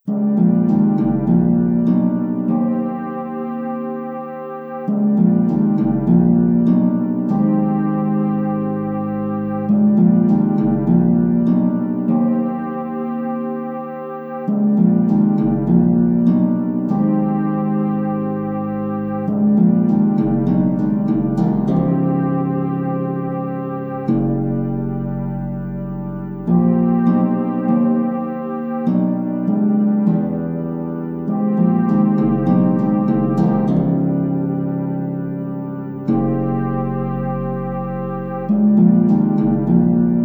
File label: villainy